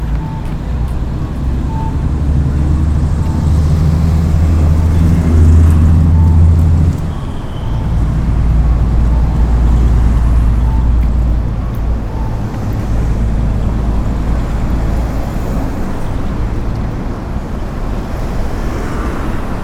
crossing Shinjuku; 新宿站西方路口; Tokyo

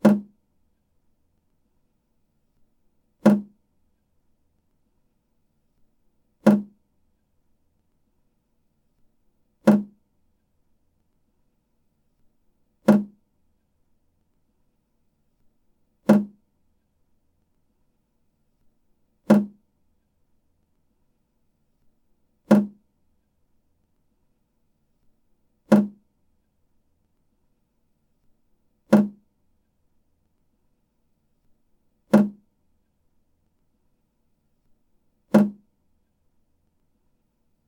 drops on sink, 台所ステンレス水滴; 台所ステンレス水滴１; wetarea

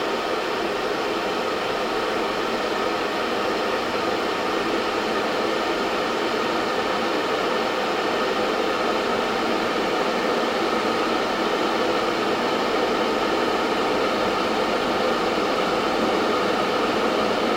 water heater; cooking

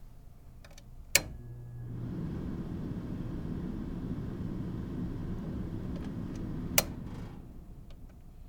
hotel heating

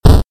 damage; attack

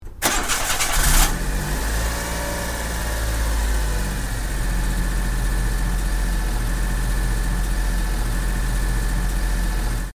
small car engine

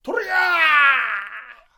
samurai shouting; jidaigeki

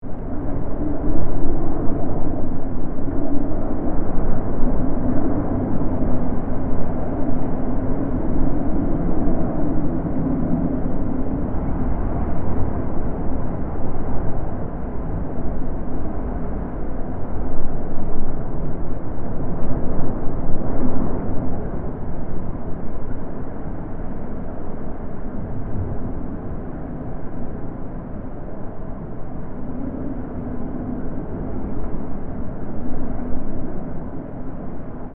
storm land

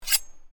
extracting a knife; jidaigeki

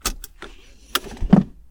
seatbelt off; car

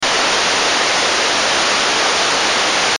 radio noise

